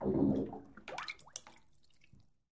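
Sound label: Bathtub Fart Short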